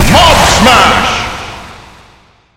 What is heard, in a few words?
slam immortal